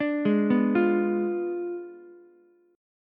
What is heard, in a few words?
Ab Diminished 7th Ab, Ukulele; Abdim7 arpeggiated; JGuitar